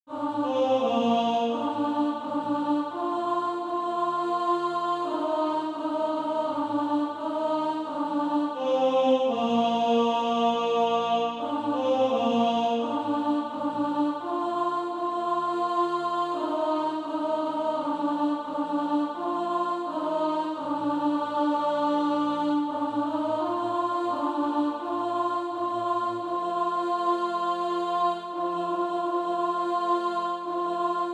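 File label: alto, SATB Practise Music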